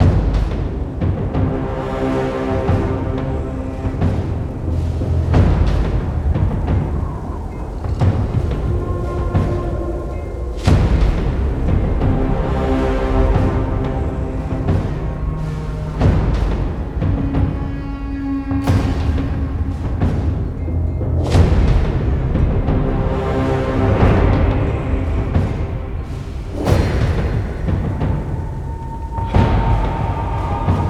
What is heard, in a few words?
main theme intensity Level 2; conan; netent